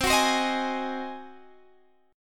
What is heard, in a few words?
Ab 7th C